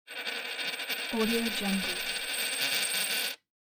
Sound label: coin spinning